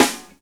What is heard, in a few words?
SD FUNK, SNARES; E-Lab; X Static Goldmine 2; akai